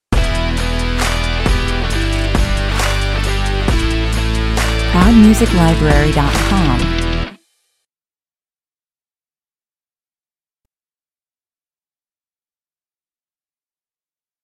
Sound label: stinger